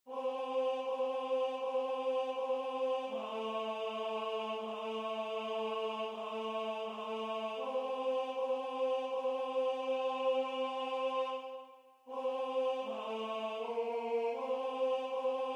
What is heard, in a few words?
Tenor